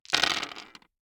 DICE on Hard Wood, Throw and Roll, Standard, 6 Six Dice; Samples; ArticulatedSounds; DICE Sfx Party (Extended)